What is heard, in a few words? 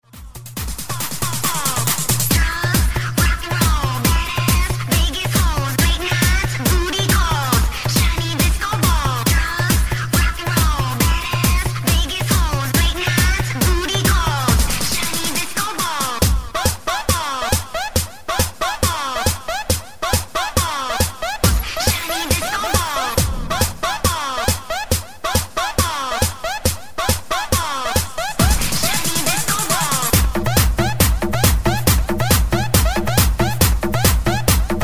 shinny disco ball; ringtone